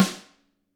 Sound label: GRETSCH SNRR, Drums